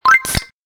Life Bonus

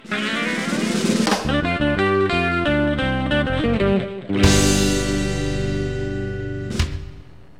Victory; rakugakids

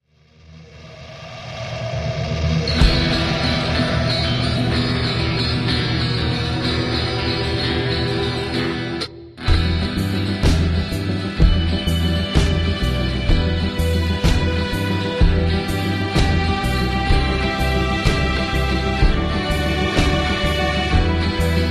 Loop Action; the walking dead season one gamerip 2012; Linear Loop Action

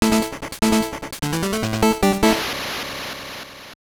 Jingle, gb; Play Action Football

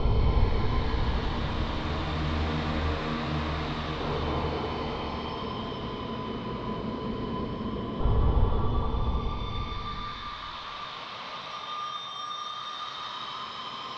Danger Tonal; Insanely Twisted Shadow Planet

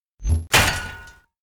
Metal Part Corner Pipe hit

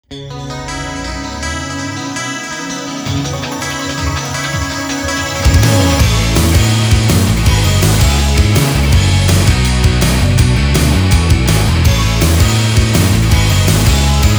without vocal; i.o.sound

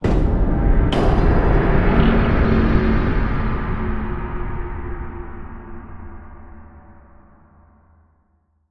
UI Stinger Defeat Panel Generic; Starcraft 2 Wings of Liberty

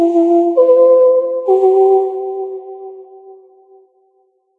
Jungle Ambience Higher; And Yet It Moves

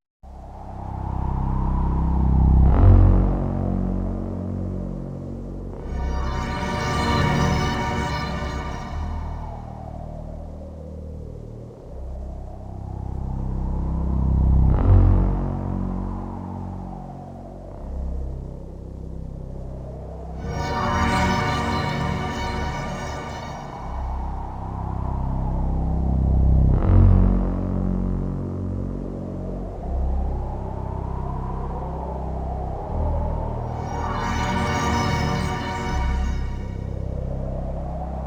Bug Ambient; starship troopers terran ascendancy; windows

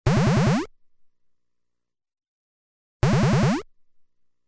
Enemies Moving in Formation Sound; Kaijuu Densetsu The Tale of the Shell Monsters

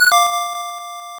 Unused Jingle; Kids Communication Pico

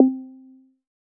Bounce

